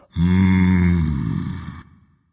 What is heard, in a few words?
content dragon; fafnir